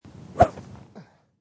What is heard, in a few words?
Golf Air Swing, Air whiff of a golf swing in air; Golf Sound Effects; Sports